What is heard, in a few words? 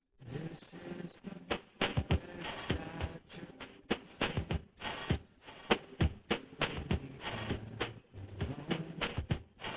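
with drums inst src; SiSEC08; SiSEC underdetermined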